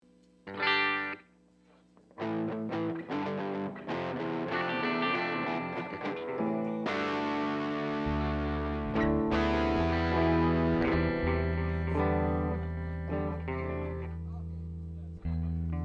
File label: Strings Mix